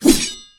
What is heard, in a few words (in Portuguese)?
espada; Glorius dead